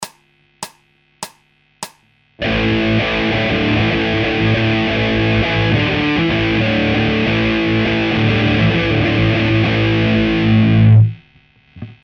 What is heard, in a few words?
Hard Guitar Loops